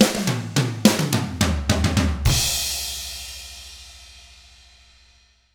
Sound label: 105 POP RK, Drums; ILIO; Double Platinum Drums 1